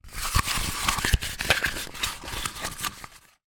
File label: toilet roll card centre handling; foley; zapsplat